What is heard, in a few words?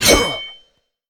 Axe Attack; we are the dwarves gamerip 2016